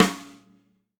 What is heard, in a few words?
Snare Rimshot Med, hydrogen drum samples